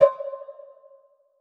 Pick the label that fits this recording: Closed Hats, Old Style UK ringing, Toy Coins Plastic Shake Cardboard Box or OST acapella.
Closed Hats